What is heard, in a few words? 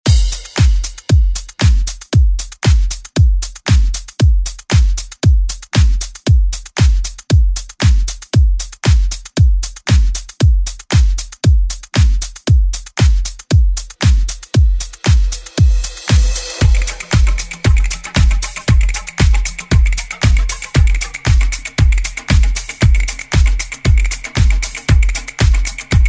80s Re Drum